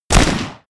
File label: Gun shoot